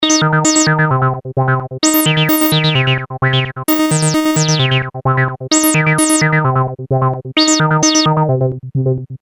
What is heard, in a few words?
Square full Reso normal Acc Decay